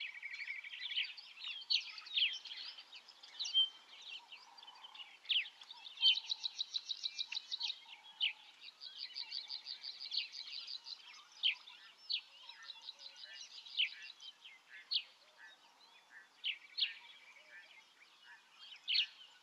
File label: ambience birds chorus; Rust